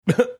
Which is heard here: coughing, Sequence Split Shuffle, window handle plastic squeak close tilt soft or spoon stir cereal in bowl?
coughing